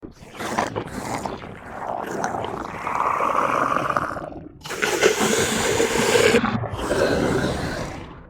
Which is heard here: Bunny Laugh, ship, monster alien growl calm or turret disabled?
monster alien growl calm